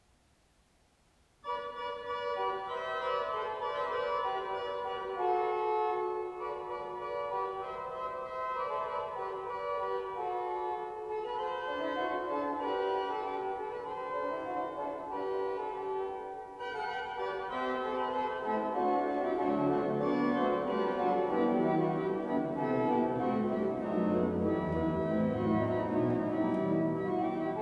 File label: improvisation